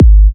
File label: Minimal Kick, Drums, Kicks